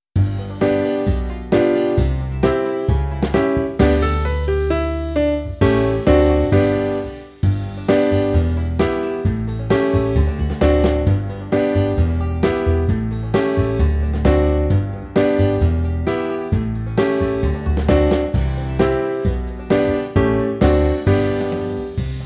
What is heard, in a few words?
Western Hoe Down Performance